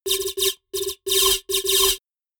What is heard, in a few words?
typewriter sequence